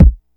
kick drum sample b key, steel kick drum sample tuned to the B note